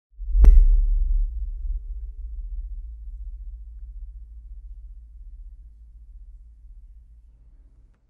Metal hit n rumble

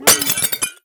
Broken Plate

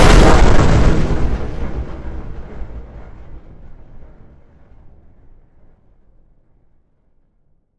Explosion bomb blast ambient

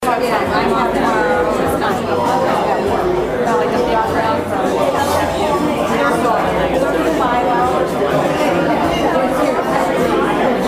Crowd In Small Room

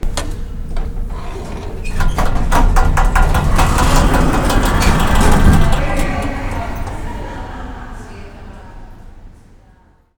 Something Opens

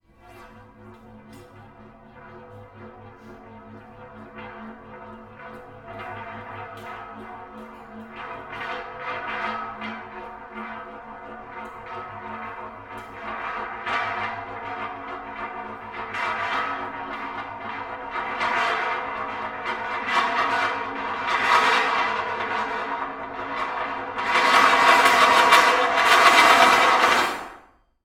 Big Steel Disk

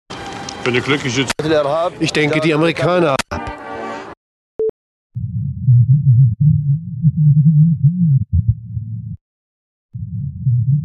Tv In Next Room Simulation Muffled

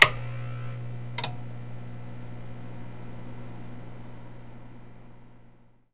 Amp

